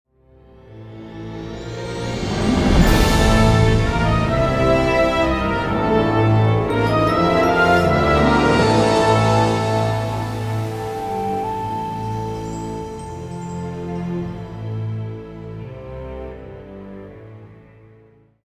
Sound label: music; freelancer-hd-edition; pittsburgh